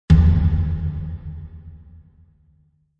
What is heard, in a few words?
Impact High Tom Long Tail, Impact hit